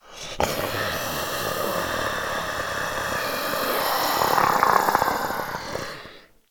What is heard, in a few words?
idle, zombie; DayZ-Epoch; dayz sfx